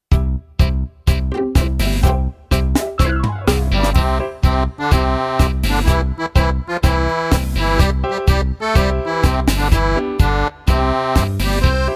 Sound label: Shofar Song